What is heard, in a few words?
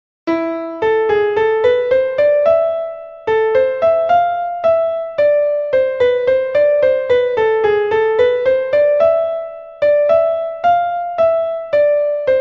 menuet